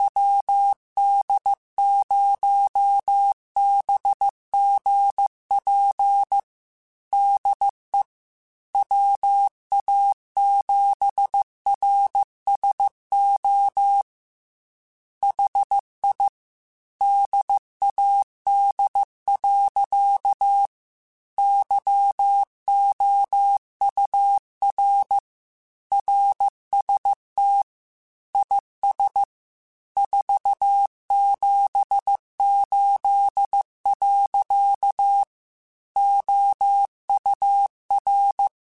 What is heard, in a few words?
Morse Code, QSOs